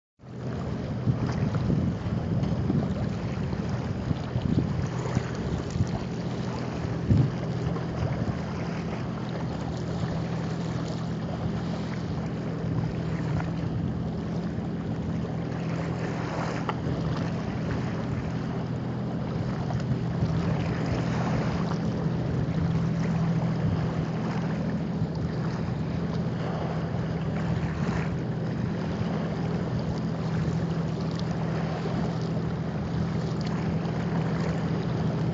volni i korabl na rasstoyanii vozle lagerya, horvatiya; kozarica v pakostane

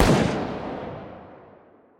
zapusk raketi iz raketnici

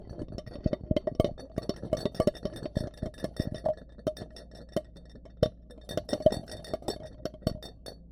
steklyannaya posuda

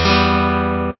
gitara akkord mi minor em voshodyashij grustnij

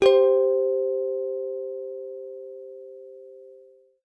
gitarnie melodii struna a s garmonajzerom

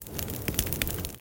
ogon vechnij trepeshushij na vetru

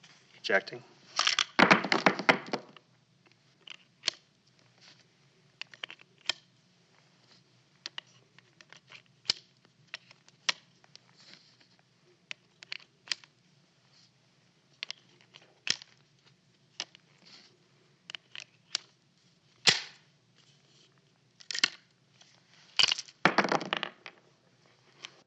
vibrasivanie gilzi iz pistoleta 357 magnum

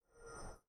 zvuk windows 7 minimize

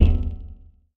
udar po zheleznoj pustoj bochke

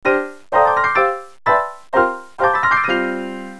odinochnij fortepiannij zvuk

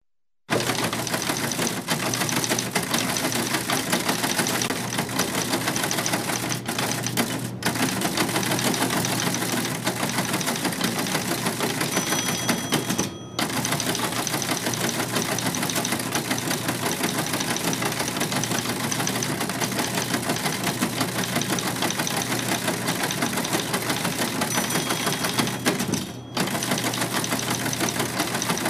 teletajp ctarogo tipa pechatanie vruchnuyu

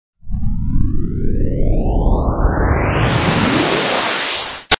poriv vetra prodolzhaet carapat zvuk

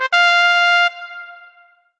korotkij zvonkij zvuk fanfar

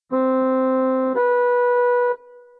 organ interval

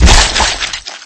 udar po ognennomu sharu

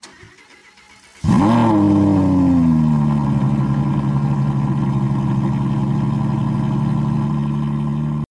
zapusk dvigatelya superkar

